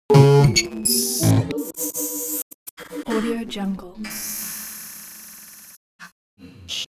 creature abstract verbal noises

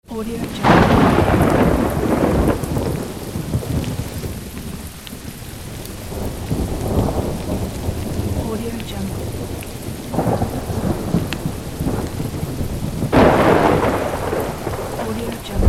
thunder and lightning; cat track

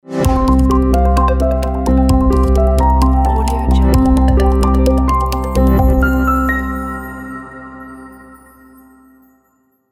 soft ident

